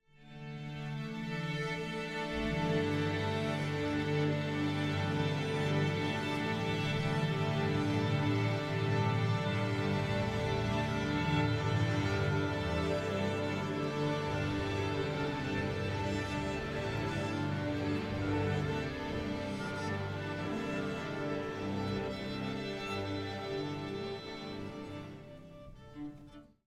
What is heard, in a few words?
Orchestra Tuning Ambience - Strings Mainly Long Symphonic - Musical; Hollywood Edge; sound designer's toolkit v2